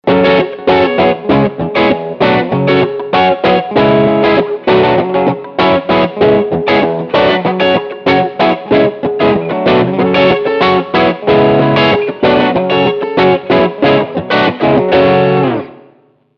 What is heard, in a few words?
crunchy funk